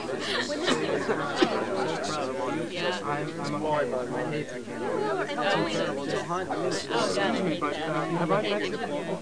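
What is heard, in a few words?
background music; Virtual Graceland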